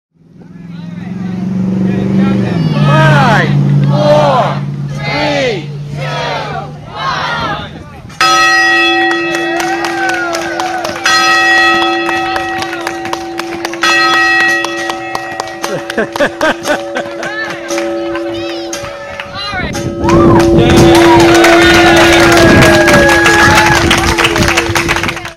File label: the BRICK Bell